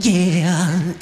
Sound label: "yeah", sung